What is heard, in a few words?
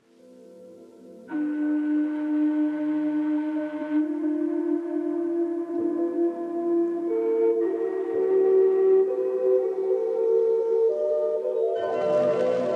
Harmony of Chimes; Zen Reflections